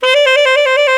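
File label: TRILL HI C-D, TENOR SAX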